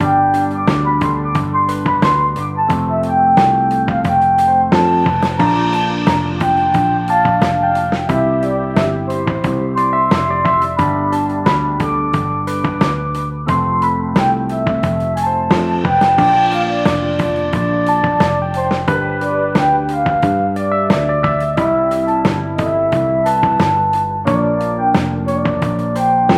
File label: Lounge - Elevator Style; Catch the Head